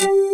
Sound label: HRD PLCK BS; Pro-Rec - Synthsonic; TRINITY